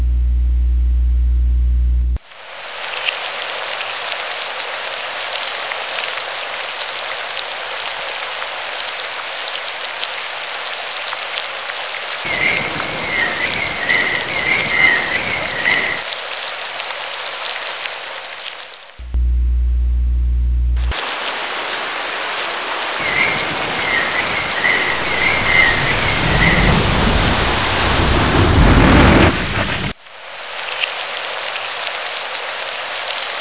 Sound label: wind rain